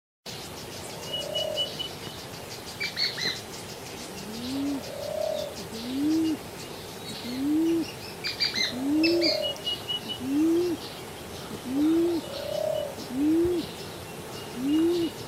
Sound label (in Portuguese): Canto do pomba de coleira